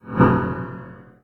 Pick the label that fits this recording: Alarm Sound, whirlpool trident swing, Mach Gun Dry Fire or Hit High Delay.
whirlpool trident swing